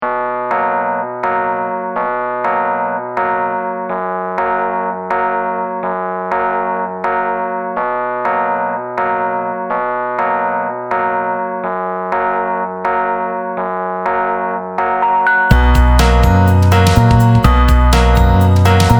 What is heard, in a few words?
Instrumental